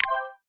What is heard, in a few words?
Pause On